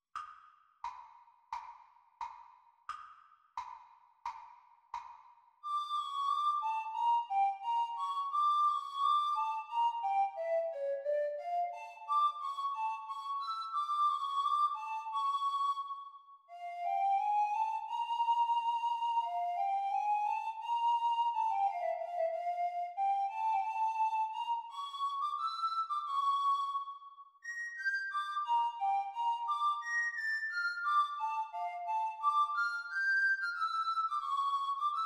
Descant Recorder Performance speed

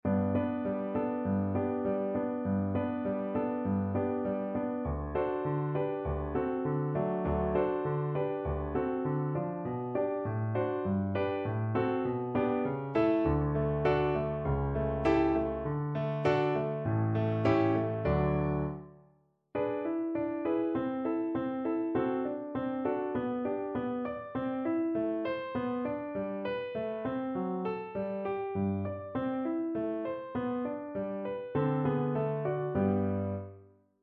syncopations medium voice accompaniment; Grade 5

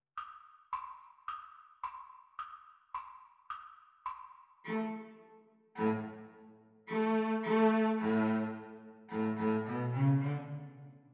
Cello Duet Playalong Exam Speed; The Teacher part

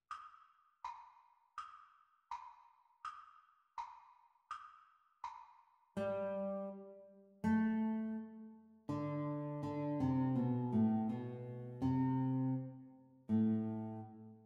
Guitar Duet Playalong Exam Speed

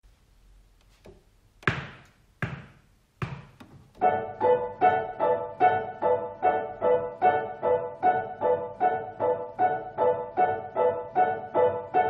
Piano Duet; Grade 3; practise speed; teacher parts

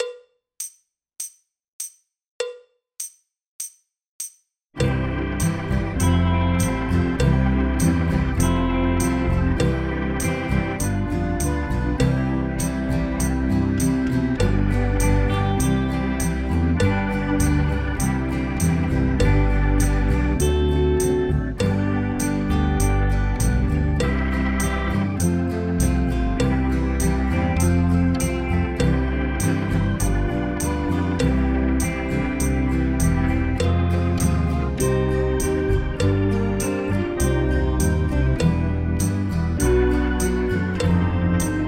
Drum Kit Playalong; Grade Four

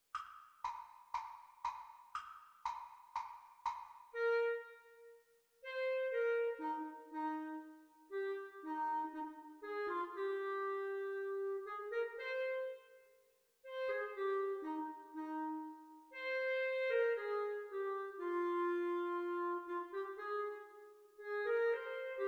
exam recording clarinet duets; Grade 3; Exam Speed; The Teacher part

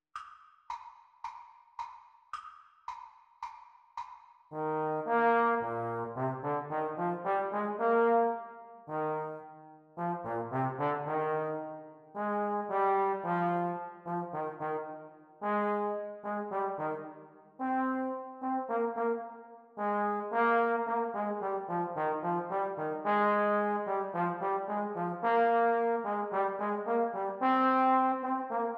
Playalong for Eb Horn Exam Speed

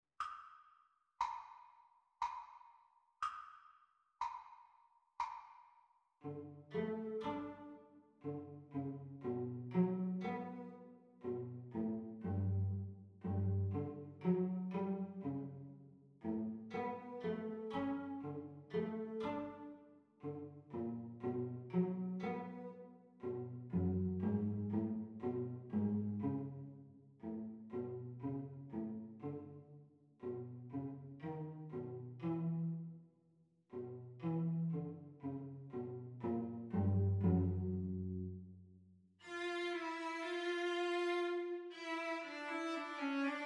Cello Duet Playalong Practice Speed

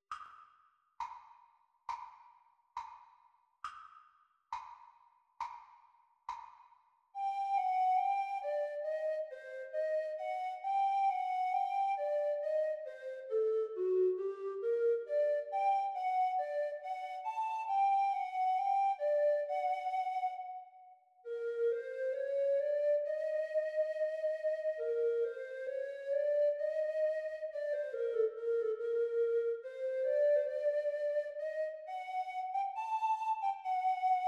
Treble Recorder; Grade 8; Practise speed

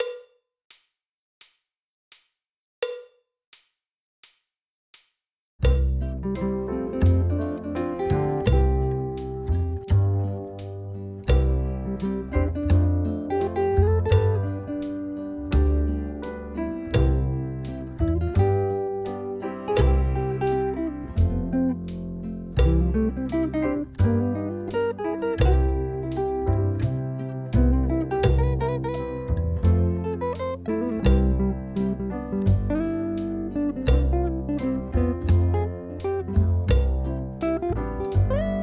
Drum Kit playalong; Grade Four

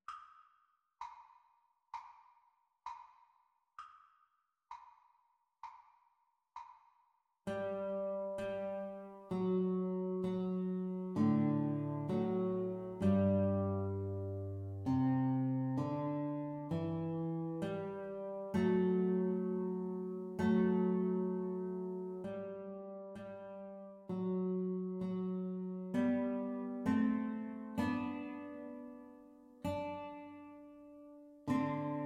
Guitar Duet Playalong Practice Speed; The Teacher part

